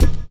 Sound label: BD; Best Service; Dance Mega Drums